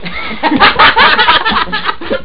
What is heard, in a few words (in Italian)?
Risata; RILASSIAMOCI